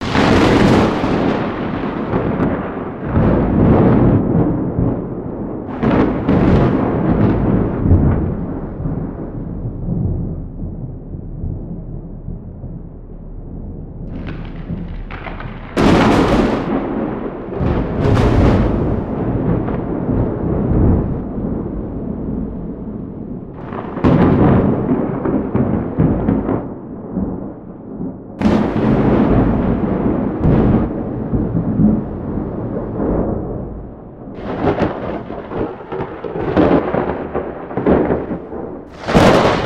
rumbling thunder

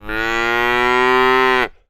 holstein cow mooing

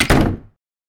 closing wooden door

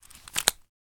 closing wallet

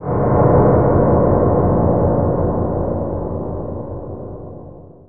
fear

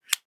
closing zippo lighter lid

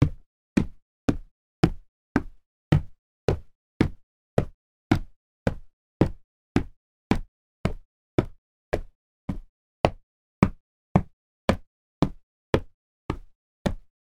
walking on floorboards

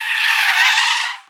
car braking suddenly

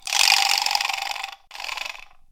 skeleton warrior bones

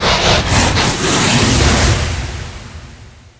impact of words appearing continuously

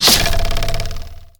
arrow hitting target

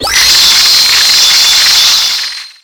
lightning magic, battle

